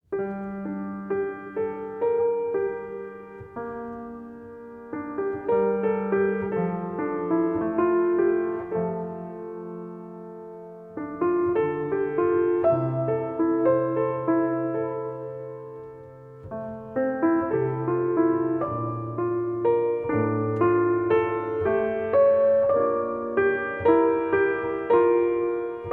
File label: Piano Solo